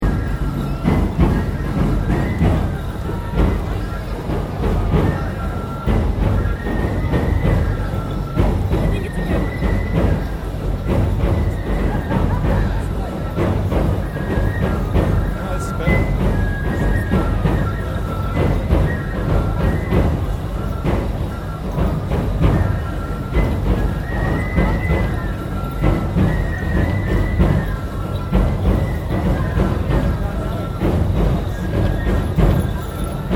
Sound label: FESTIVAL AOMORI NEBUTA FESTIVAL DRUMS LOOP, Japan; Nebuta Fesitval in Aomori Japan; Concerts (Sound Effects:Backgrounds)